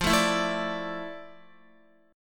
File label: F Major 13th Guitar; strummed; JGuitar